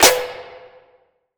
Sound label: Odyssey Future Bass Snare, B; Cymatics